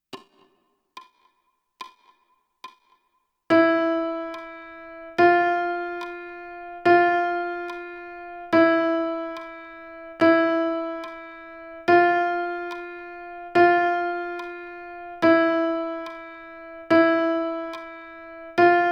Playalong Chromatic Trainer A Flute small range, bansuri; playtalong tracks to train the chromatic notes on the bansuri